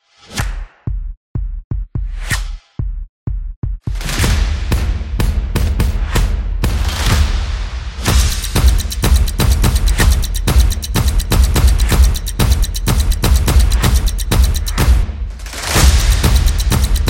Drumming Energy; event promo

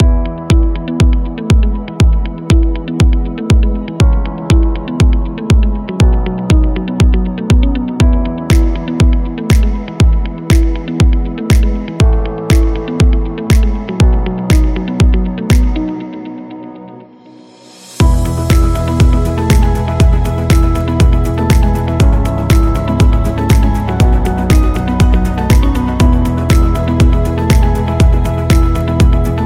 A Business Loop

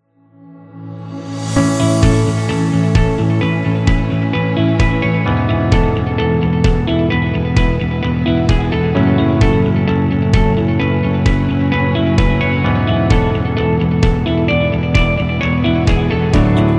Corporate Lite Rock, corporate music; corporate music vol 7

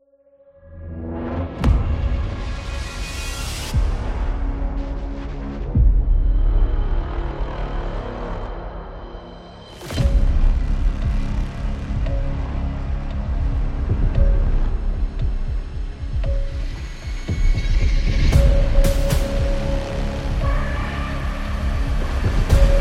Tense Horror

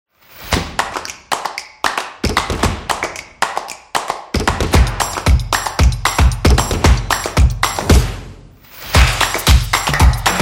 Drums Percussion

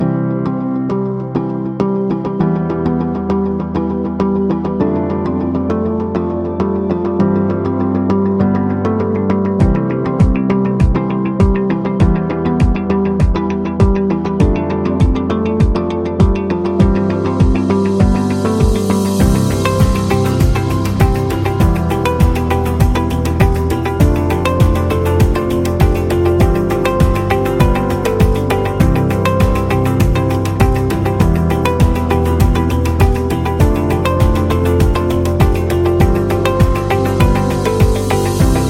Corporate Calm

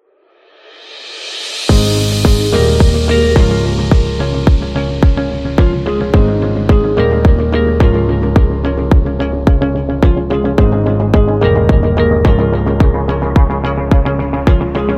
Business Corporate; corporate interview

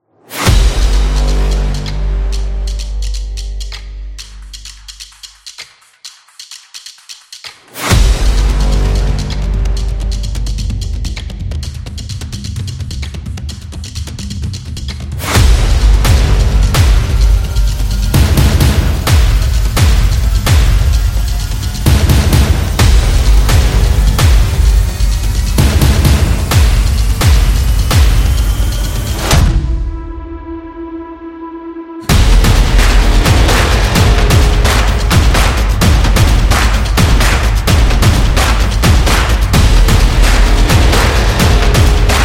Sport Epic

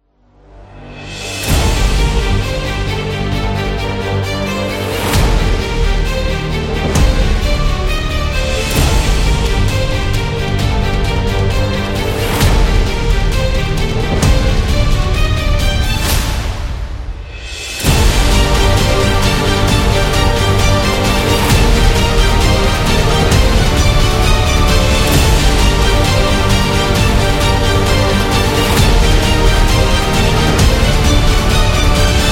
Epic Trailer

